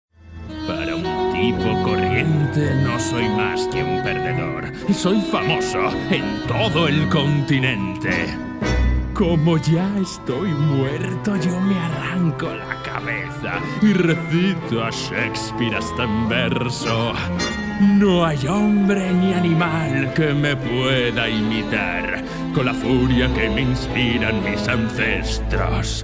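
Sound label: castilian spanish male singing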